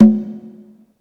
E Conga L, Yamaha PSR E305

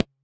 THROAT; Spectrasonics; Metamorphosis; THROATERS

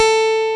A3, GUITAR; AKAI S-Series CD-ROM Sound Library VOL-8

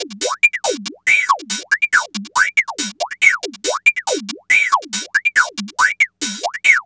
NRG Cymbal

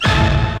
Hit; ZERO G - Datafile DISC 2

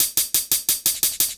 D&B Percussion Loop; neuro

